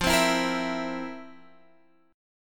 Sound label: F Major 7th Suspended 4th, Guitar, Open F; FM7sus4; x,12,12,11,13,11; strummed